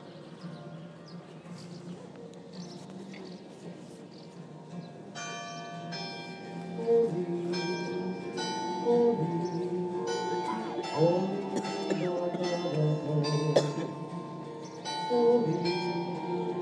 holy holy holy sung at grotto mass lourdes

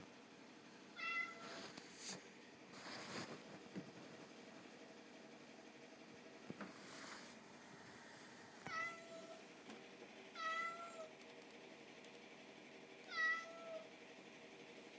meows

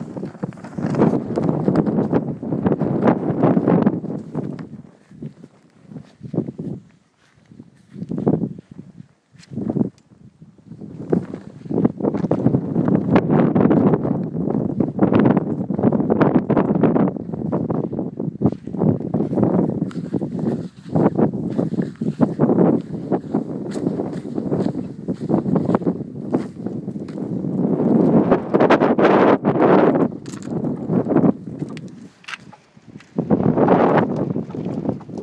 outside noises

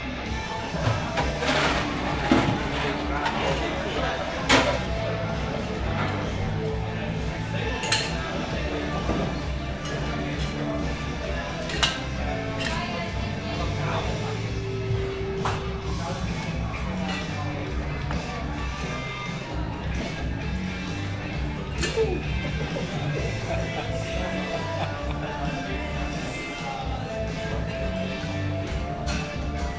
music at the hard rock cafe; venezia

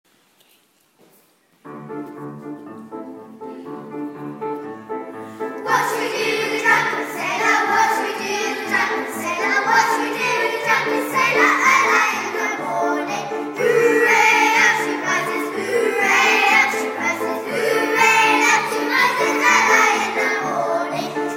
drunken sailor by choir in 4 parts

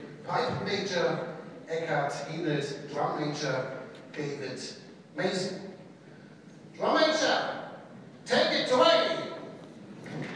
pipes and drums; legion osnabruck

